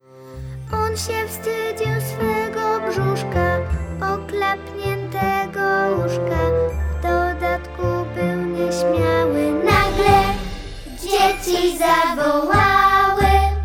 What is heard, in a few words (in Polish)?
wersja wokalna